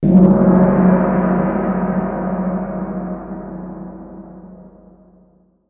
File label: gong